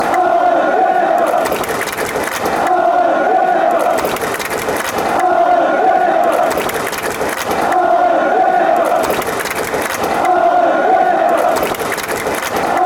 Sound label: clap; alan mcrgregor